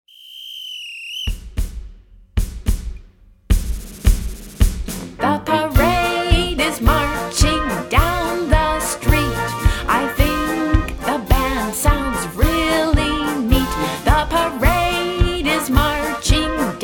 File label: Marching band